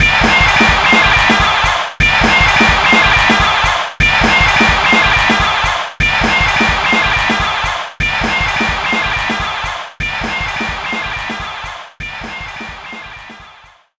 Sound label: Win; hot pixel; psp